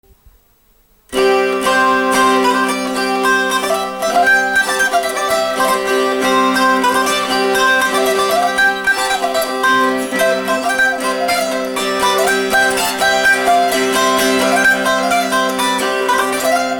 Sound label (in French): epinette